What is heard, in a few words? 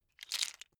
bone small handling left